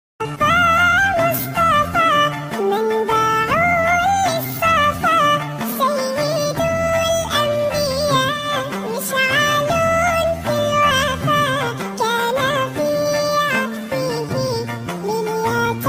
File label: naat ringtone; islamic status